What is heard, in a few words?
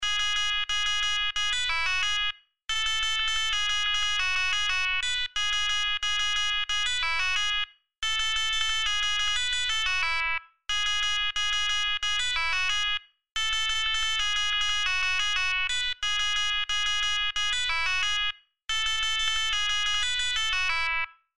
cellphone